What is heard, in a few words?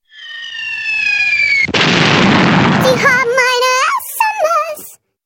Funny Explosion